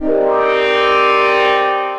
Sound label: Chord Keys, Pad; Eye Chord Keys; Ableton; Factory Packs; Punch and Tilt